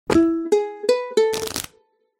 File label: open app